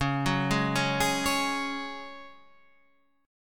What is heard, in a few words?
C sharp Suspended 4th C sharp down Guitar Standard; arpeggiated; JGuitar